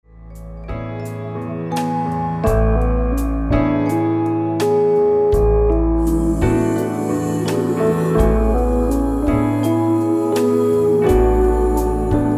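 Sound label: crystal lullaby instrumental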